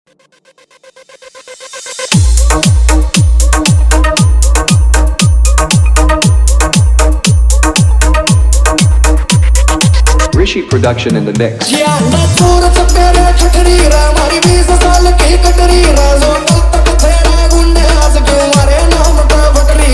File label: Hard Bass Vibration Mix; Rohtak 3